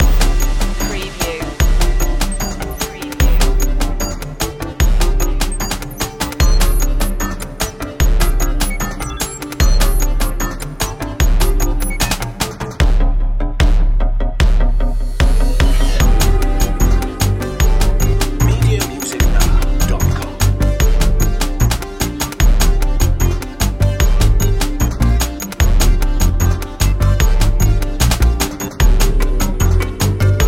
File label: digital clockwork long looping